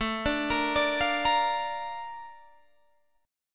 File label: Bb Major A, chord; Bb/A; arpeggiated